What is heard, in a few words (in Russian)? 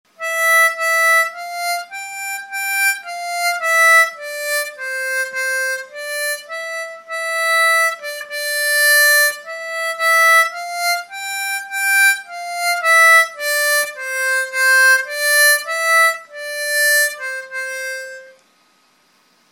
губной гармошке; Ода к Радости (9 симфония Бетховена)